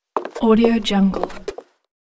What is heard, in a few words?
dress shoes turn wood